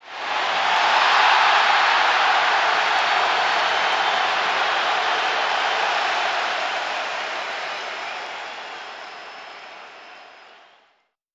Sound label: appeal pose shout